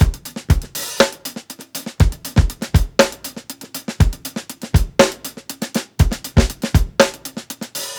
120 BROKEN 16TH HATS, DRUMS LOOPS; BASEMENT DRUMS VOL 2

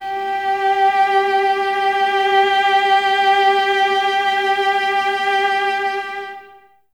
violas G 4, String Ensembles; Miroslav Vitous; VLS 1+2

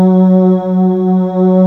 SYN, JD; Best Service Gigapack 1

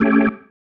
Stab, Organ, One Shots

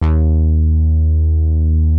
Prophet 5 E3, Synthesizer; Hollywood Edge - Giorgio Moroder Rare Synthesizer Collection

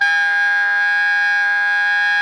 SOP GR G#4, Saxophones; Greytsounds; Philip Wolfe David Crozier's Saxophones

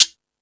Percussion

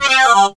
TALKBOX

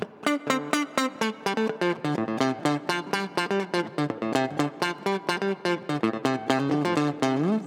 Guitar, 125bpm Dm, Nu Disco; Zero-G; Construction Kits; Speedy

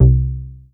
C3, BASS

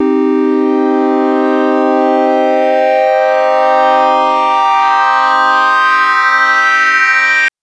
Synth TriangleSweep min c3; TrashLibrary

